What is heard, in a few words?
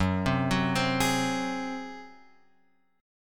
F sharp Suspended 2nd Flat 5th F sharp, Chord; F#sus2b5 Chord {2 3 4 5 X 4} arpeggiated